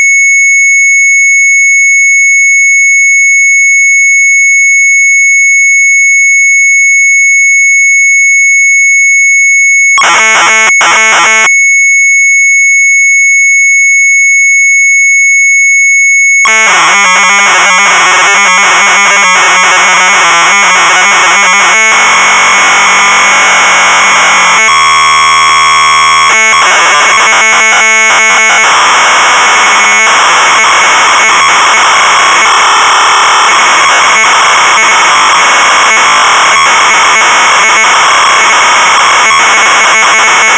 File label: MZ800 Tape, sharp; SCRAMBLER; retromelsarchive